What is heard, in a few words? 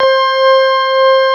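CX3 organ C5 L, Keyboards Old School; USB Soundscan vol.09; AKAI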